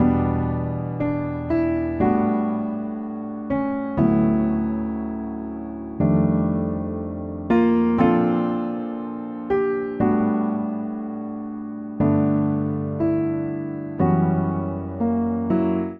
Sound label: Progression ii7-V7sus4-I-bIII7-bVI9-V7sus4-IV-III7 D Major 60 bpm, Upright Piano; MIDI Clips; Reaching The Horizon; Ableton; Factory Packs; Spitfire Audio